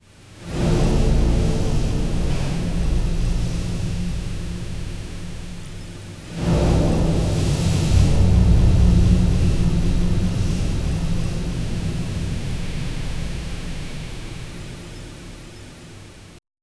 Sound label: soft elegant background, ambient music; SFX Engine